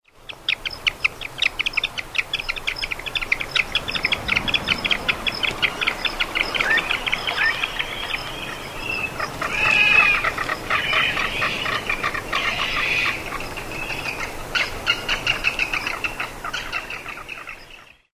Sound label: calls at a colony; MEX